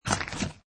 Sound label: Bag